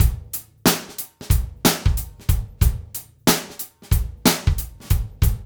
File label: DRUMS; East West; Funky Ass Loops